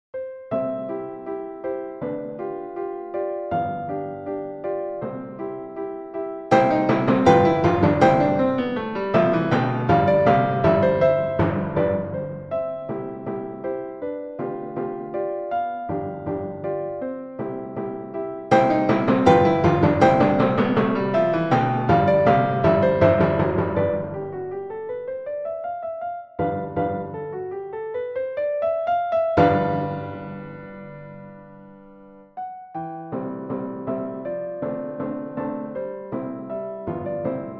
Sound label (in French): a 80 bpm timbales et piano